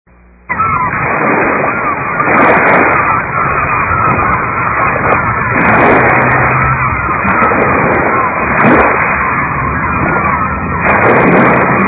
By The Sea; At the sound of the beep

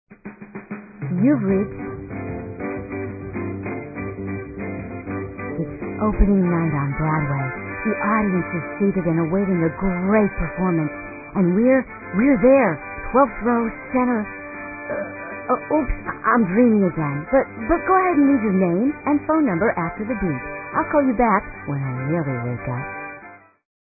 Broadway Opening

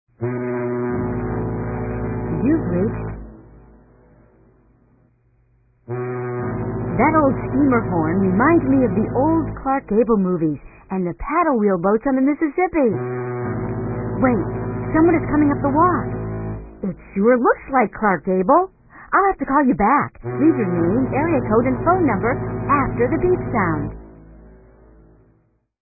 Old Streamer Horn

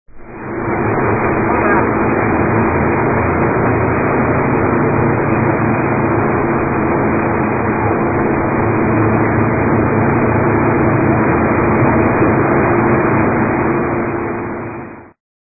Speed Boat

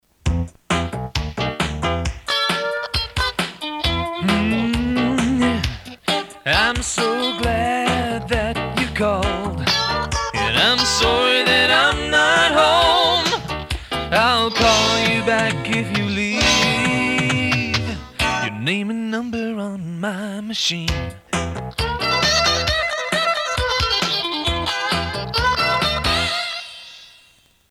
Telephone Tones Top 40 Tone; ATT Telephone Tunes

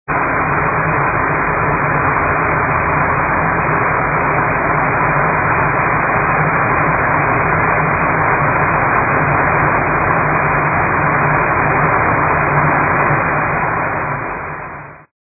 Kettle Boiling

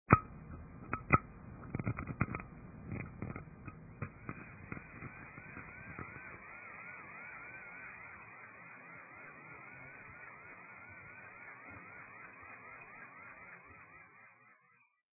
Dentist Sounds

